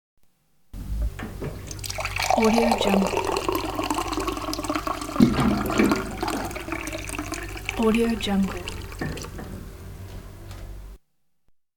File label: water cooler